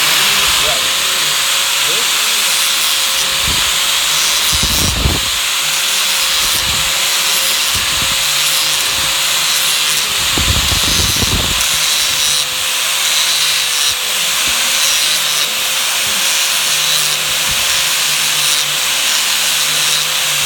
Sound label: Flat grinding